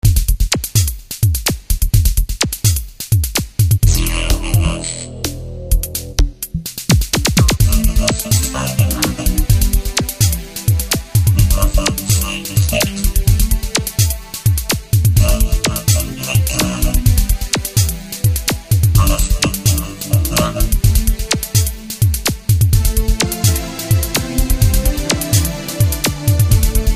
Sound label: vocoder